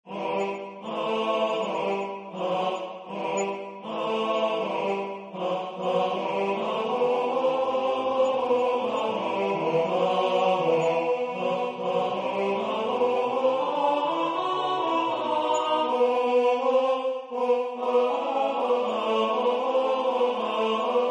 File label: tenor; Der Panther